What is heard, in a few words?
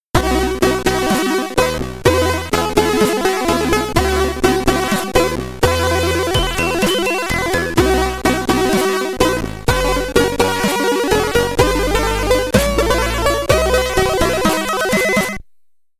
Ending (SID Stereo); Robocop 3; Commodore 64